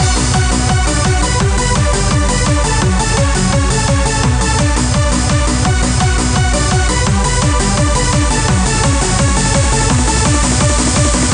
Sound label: blue anthem remix